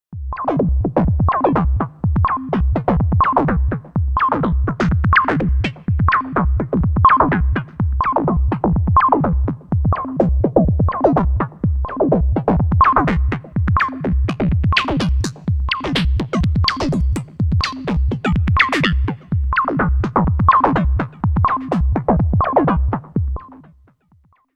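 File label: Hydrasynth techno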